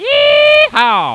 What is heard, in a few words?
Yeehaw